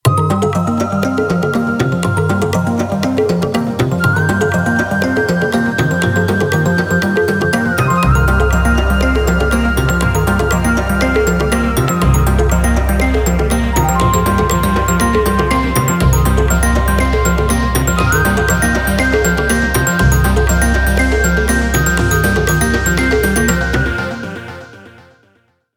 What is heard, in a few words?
ELECTRONIC Fusion; Nokia; Henry Daw